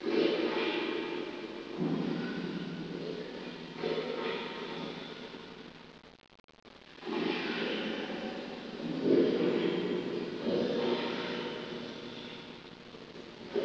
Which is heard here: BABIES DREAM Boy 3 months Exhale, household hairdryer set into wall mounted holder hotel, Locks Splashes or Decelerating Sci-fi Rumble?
Locks Splashes